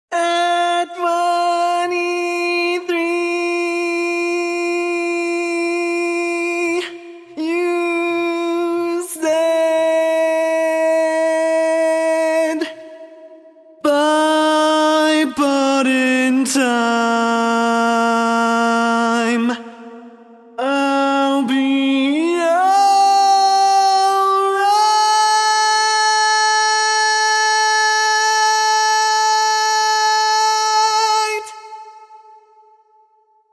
Bari, barbershop tags

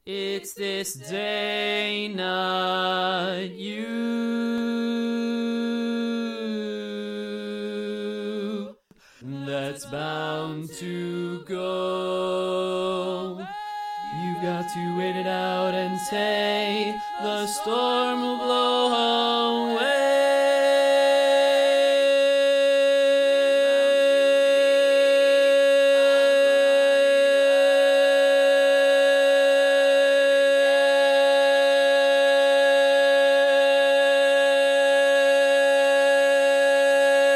Bass, barbershop tags

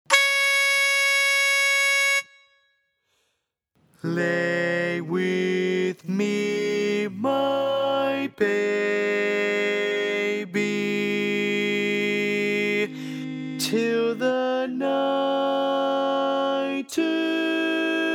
Lead, barbershop

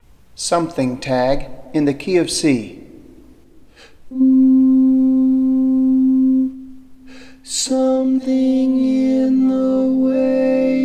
Lead, barbershop

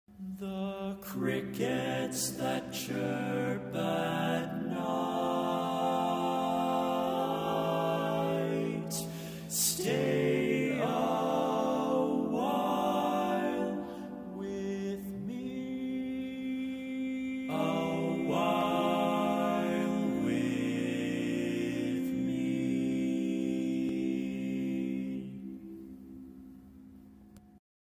Crickets at Night Lead, barbershop tag

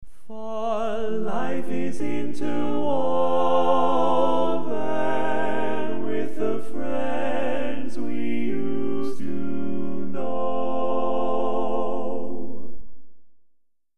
Tenor, barbershop tag

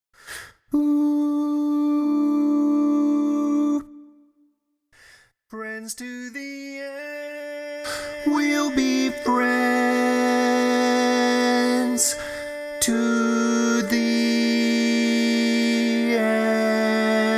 Bass, barbershop tag

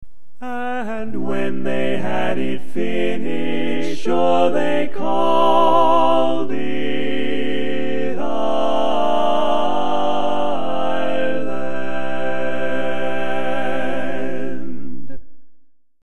barbershop tags, all parts

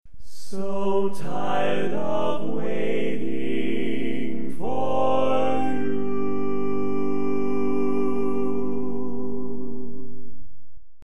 Lead, barbershop

